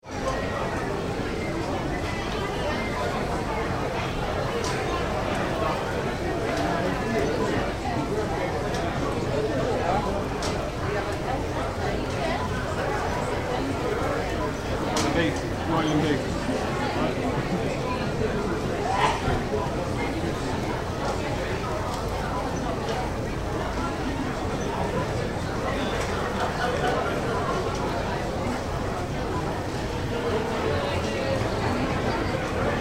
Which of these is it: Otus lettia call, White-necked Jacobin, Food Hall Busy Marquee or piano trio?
Food Hall Busy Marquee